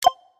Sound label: Button Press; kritter krawler